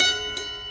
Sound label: Prepared Piano; Battery 3 Sample Kits